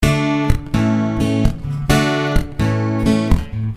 Punchy D,A,D,A 126

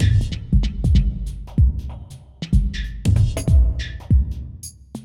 Deep Mover 95

